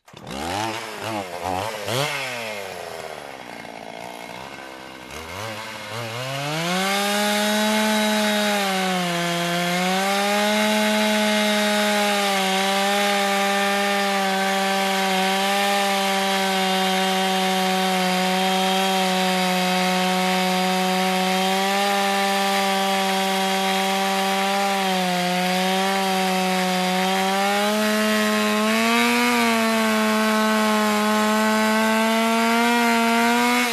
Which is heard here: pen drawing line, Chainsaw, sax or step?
Chainsaw